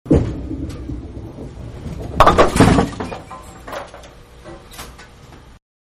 Bowling-Spare-Several Pins, Very Close; Bowling (Sound Effects:Sports); Manhattan Production Music

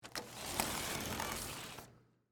Door Wall Slide, Foley, Door, Wall, Slide, Open; Blast Wave

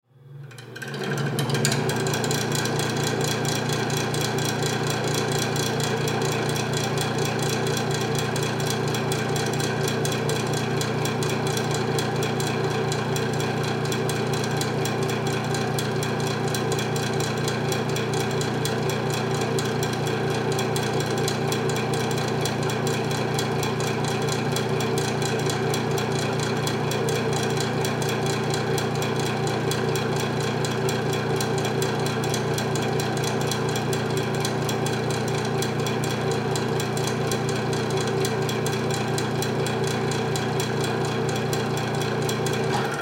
Fan On Run Off Rattle; Industry Fan On Run Off Rattle Public Bathroom; Fans; Electronics; Blastwave

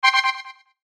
CHIME DELAY PAN; Chimes (Sound Effects:Multimedia)

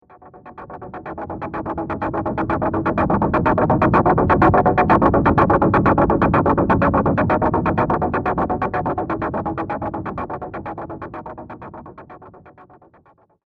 Wig Out In A Wormhole, Sci Fi Music; science fiction sound effect; Andrew Fleming

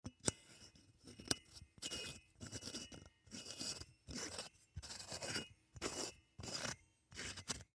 Desk Lamp Light Bulb Screw In; Household; Office; Lamps; Blastwave

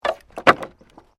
Wood Movement Minor

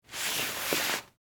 Sleeping Bag, Hand, Wipe, Short, Movement; Sports; BlastWave

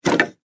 Sports Billiards Cue Stick Remove From Rack Pool; SFX Bible

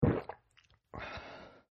Man Swallow Water

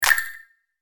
Hit Burst Vox, Hit Burst Vox Clap; Production Element Imaging Element Accent Transition; Bursts; Blastwave

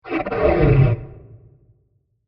Creepy Horror Transition; Scary Soundscapes

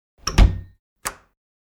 Closing and locking a door; Miscellaneous Doors

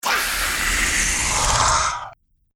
Scream Vampire, Monster Sound Effects; horror

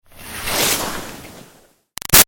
Skiing By Fast Snow; Skiing and Snowboarding; Sports; Big Room Sound

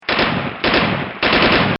Machine Gun-Single And Double Shots; Military; Bobby Cole

